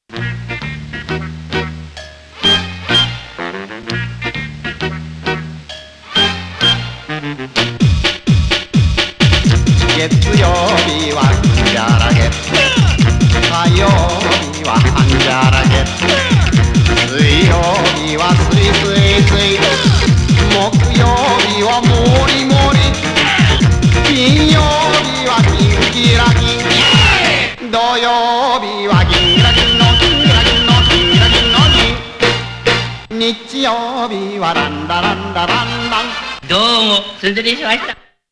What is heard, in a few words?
beat; OCHO; Ueki Beat